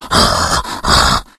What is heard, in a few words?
tank fail, voice, growl; Left 4 Dead 2